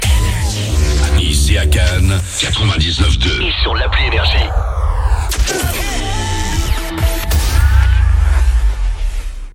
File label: JINGLE; NICE ET CANNES, 99.2 FM; NRJ